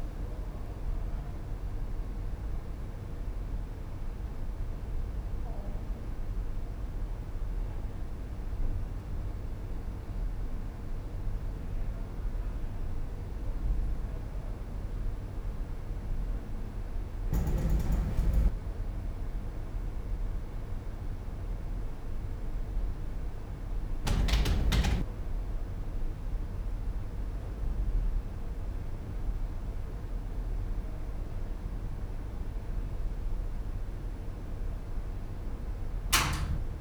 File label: office; IEEE AASP; events office synthetic; snr6 low